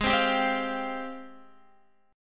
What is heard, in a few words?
G sharp Major 13th, Guitar; x,11,12,12,12,13; strummed; JGuitar